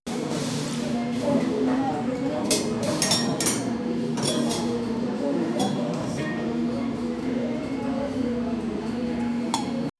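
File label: background ambiance of a, cafe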